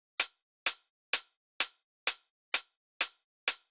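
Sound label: Drum Loop 128BPM hi-hat; VEC5 Drumloops; VEC5 Multi Loops 128BPM; Loop Elements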